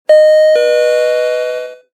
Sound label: ding noise